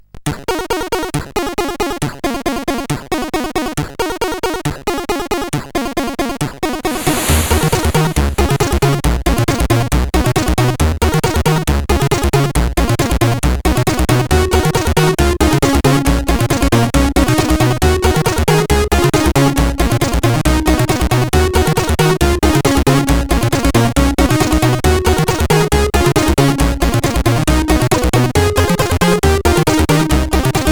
Disco, CSG8580R5; Disco DRAX

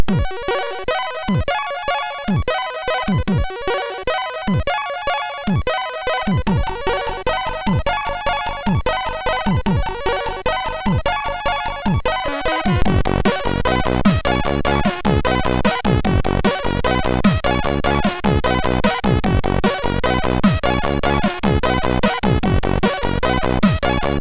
Labamba, sid MOS6581R3; hvsc

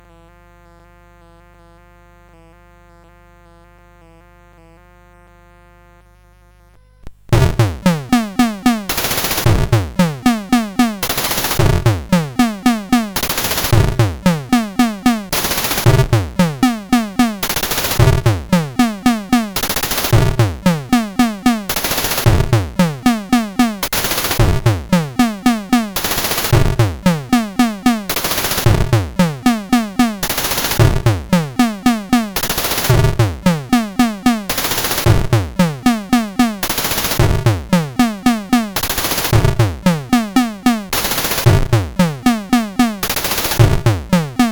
Drum Demo, CSG8580R5; SoundEffects

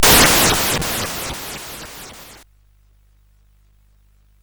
Frantic, CSG8580R5

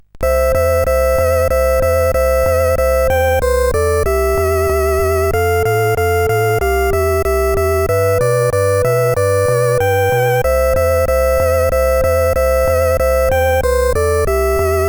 Hells Jingle, CSG8580R5

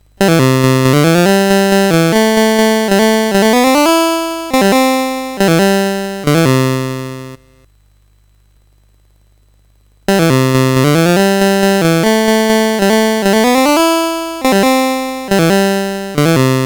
Dixie, MOS6581R3

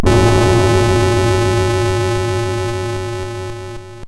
Gong, MOS6581R2

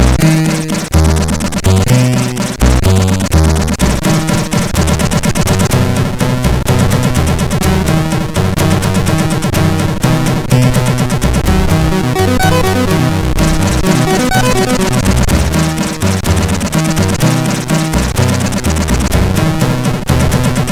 Orchestra, MOS6581; hvsc

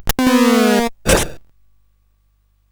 Fire Breath, CSG8580R5

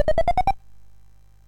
MOS6581R2, GAMES; Sammy Lightfoot

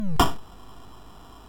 sid MOS6581R2, GAMES; Face Off

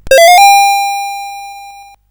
Buggy Boy, CSG8580R5

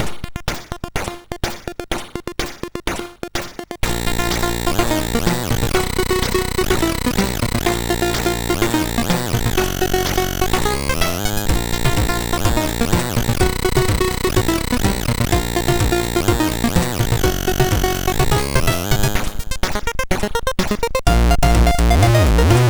sid, MOS6581; MOS6581R3; Luftrauserz

